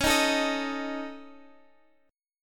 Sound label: C sharp Suspended 2nd Sharp 5th C sharp Guitar, chord; C#sus2#5; strummed; 21,18,19,20,x,x